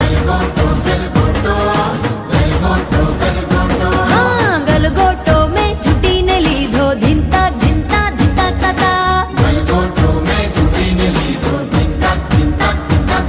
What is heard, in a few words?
Garba Gujrati Ringtone